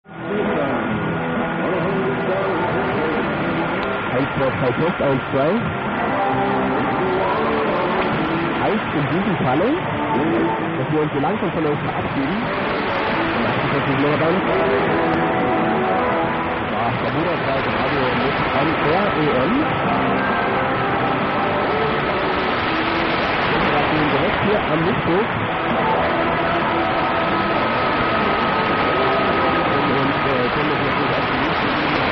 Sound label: 6245 kHz; Bermudadreieck